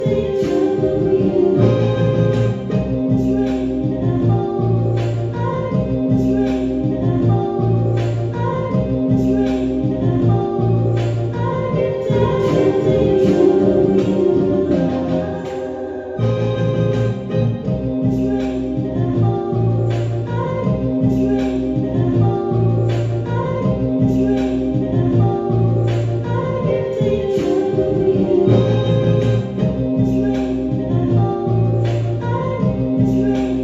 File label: INSTRUMENTAL